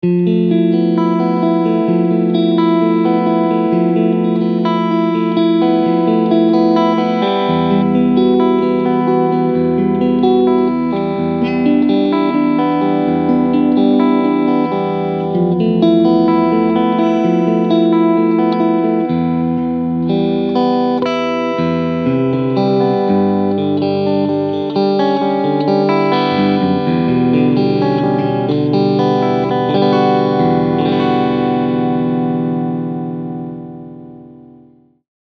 FGN Boundary Odyssey Cleansound Front Middle Singlecoil